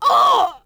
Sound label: hand hit; Star Wars Knights Of The Old Republic II; XBOX